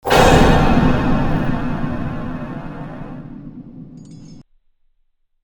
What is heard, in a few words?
Hit; cry of fear